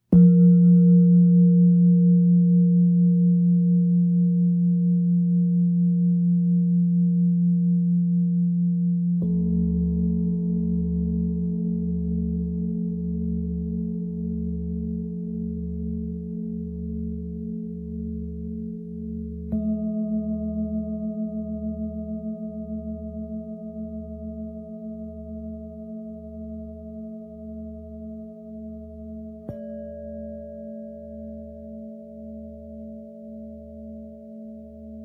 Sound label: Sound Bowl Recording